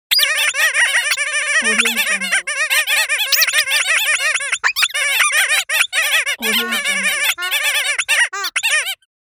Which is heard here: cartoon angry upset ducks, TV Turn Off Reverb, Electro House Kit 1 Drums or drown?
cartoon angry upset ducks